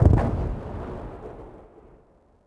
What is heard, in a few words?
distant explo; impacts explos